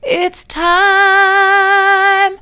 it's time soft, vocal

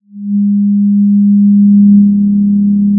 Sound of a cruise ship horn

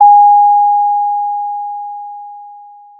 Sound of a doorbell

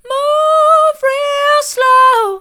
move real slow mid, vocal

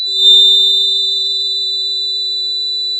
pop, Sound of a school bell

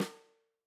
Tam Snr, drum

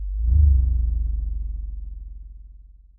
Sound of an owl

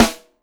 Snr, drum

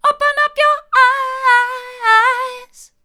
hard, vocal

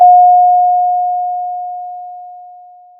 pop, Sound of a doorbell

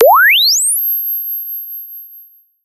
pop, sound of a bike bell

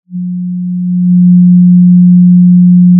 pop, Sound of a cruise ship horn

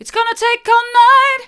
its gonna take all night hard, vocal

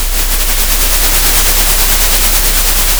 pop, Sound of a train

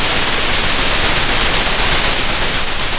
pop, Sound of a thunderstorm

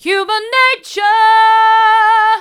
human nature hard, vocal

